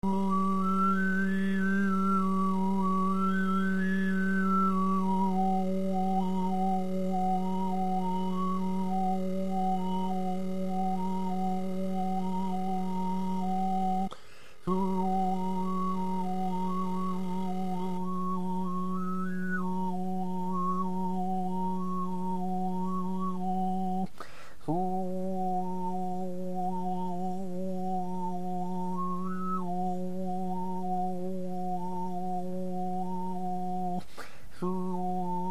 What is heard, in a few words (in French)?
chant diphonique